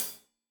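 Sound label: hat closed, hi-hat cymbals stereo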